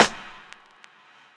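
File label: nice snare drum sound A key, snare tuned to the A note; snare-drum-samples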